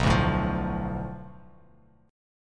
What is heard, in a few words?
B Minor 7th Flat 5th B Bass Standard, chord; Bm7b5; strummed; 7,5,7,10; JGuitar